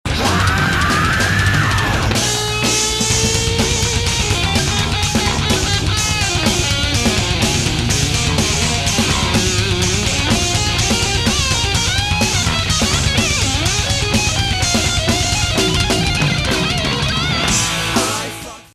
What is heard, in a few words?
solo